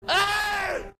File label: Eaaaaugh; The Room Soundboard